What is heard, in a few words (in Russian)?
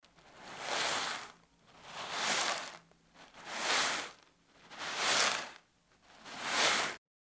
zvuk peresypanija